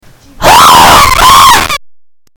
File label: scream